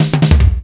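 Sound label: rock percussion; proteus1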